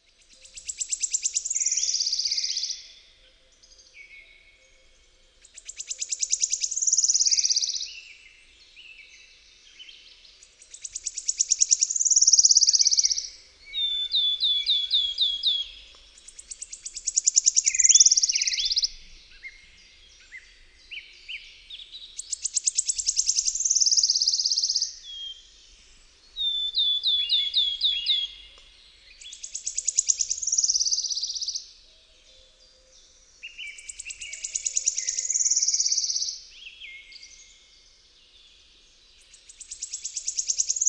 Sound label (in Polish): SWISTUNKA LESNA, birdsounds